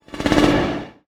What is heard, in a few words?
Notification